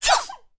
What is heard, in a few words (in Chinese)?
熊猫打喷嚏声